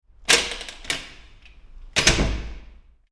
Security Door open and Close, Interior wooden door; with bolt/latch; Prison Doors; Tom Hutchings